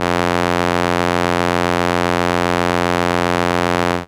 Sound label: Viola F2, ARP Solina Unmodulated; musicradar-synth-samples